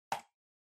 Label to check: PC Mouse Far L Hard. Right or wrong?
Right